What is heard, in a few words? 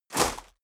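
step dress boots gravel medium jump, footsteps; schritte; menschen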